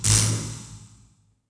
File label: Zap Med; electrical sparks